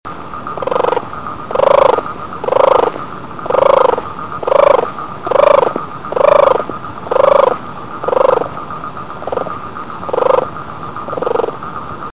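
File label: cherepaha golos, turtle voice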